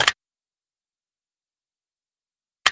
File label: makeup; abigail